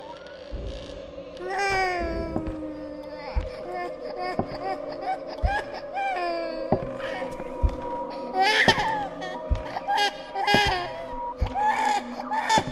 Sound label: Crying